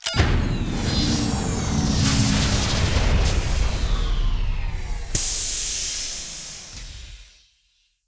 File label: power off; StarSiege